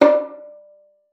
VIOLIN

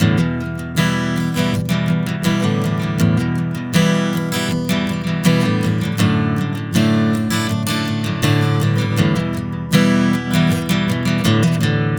Acoustic Rhythm, Acoustic Guitar; Sonic Foundry (Sony Creative Software) - Continental Drift Aftershocks; Native American

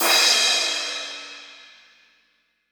brush; Northstar - New Gold VOL-2; 30S BRUSH

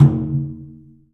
DRUM; The Piano; InVision Interactive

